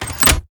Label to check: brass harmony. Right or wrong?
Wrong